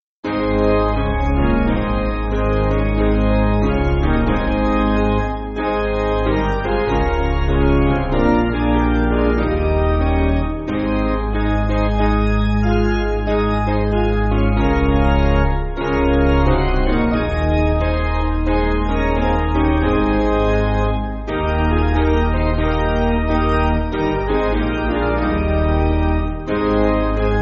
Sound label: Piano, church music